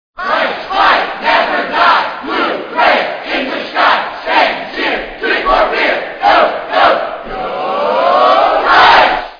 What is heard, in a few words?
Fight Cheer